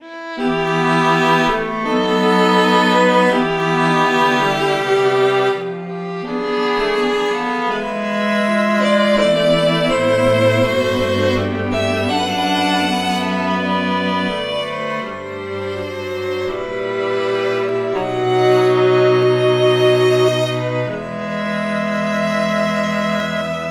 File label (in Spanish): Obertura